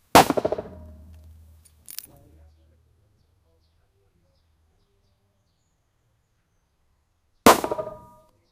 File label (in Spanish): varias flechas saliendo; bow and arrow